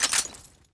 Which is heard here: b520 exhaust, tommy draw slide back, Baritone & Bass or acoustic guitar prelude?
tommy draw slide back